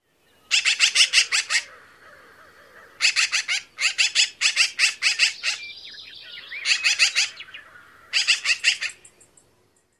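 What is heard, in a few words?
shek-shek-shek calls, Black-billed Magpie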